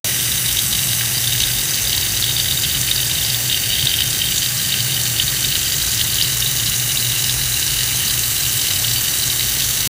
pipe pressure, leakage; pipe sy pani k pressure

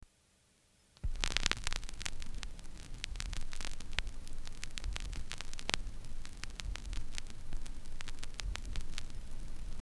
vinyl start sound effect

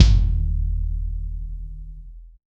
808 bass; hoodtrap

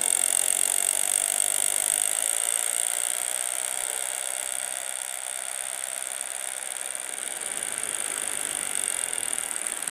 mother bike sound, bike

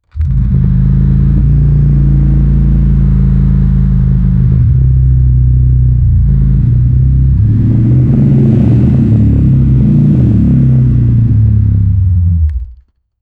the subaru sound is a, automotive

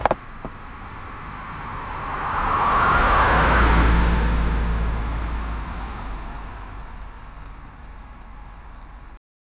car driving; vehicle

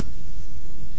generate a tip sound effe, user interaction

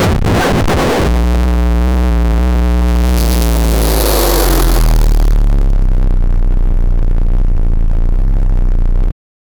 chiptune sfx damage recei

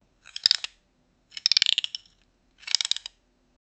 games

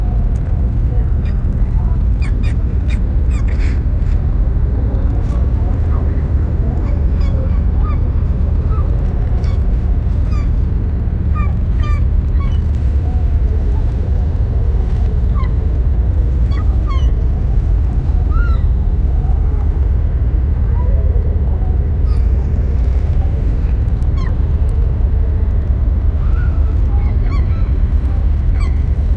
terror in the port and, harbor

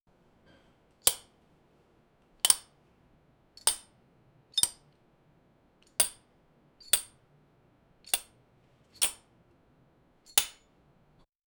doing incline barbell che, fitness

